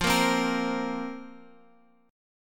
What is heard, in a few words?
F Suspended 4th F down Guitar Open F; Fsus4; strummed